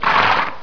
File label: farm